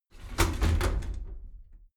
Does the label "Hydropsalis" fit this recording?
No